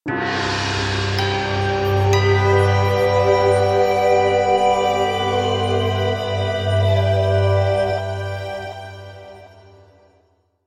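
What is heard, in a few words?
sounds with an effect of completion, ending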